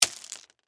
Stones Single, Impact, Stones, Single, Drop, On, Pile; Rocks; Materials